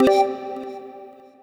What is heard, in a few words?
notification mobile